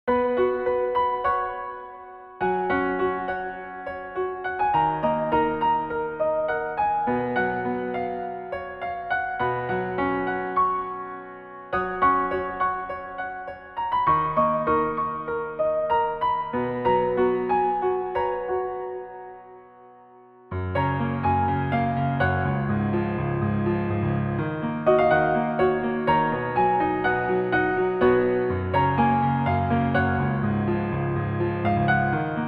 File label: Piano